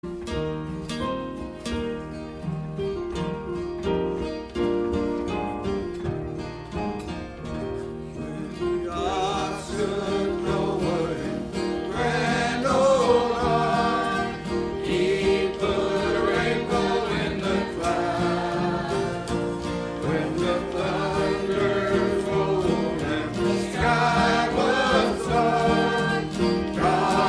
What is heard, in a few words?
Choir